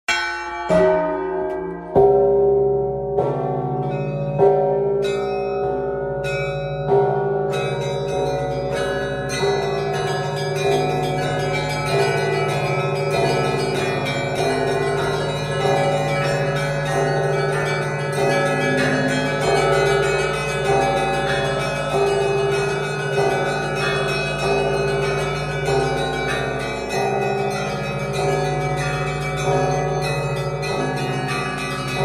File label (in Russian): zvon kolokolov pochaevskoi lavry